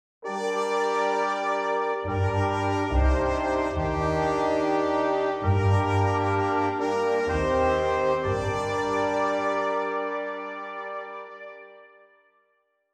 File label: Choral Varie Op55, ORCH; D'indy